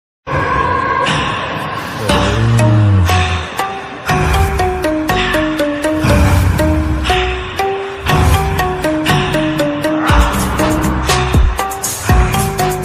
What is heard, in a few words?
ringtone trap; zombie reddy